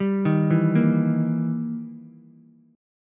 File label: E Minor Major 7th Flat 5th, E, down, Ukulele